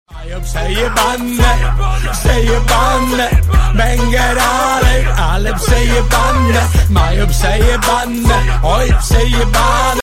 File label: oppo f5 ringtone